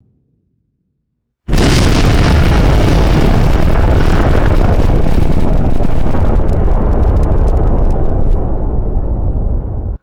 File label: heavy reverberant explosion with deep rumble; explosions